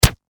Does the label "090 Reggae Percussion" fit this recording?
No